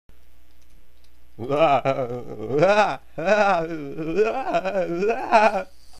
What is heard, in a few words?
mwhahaha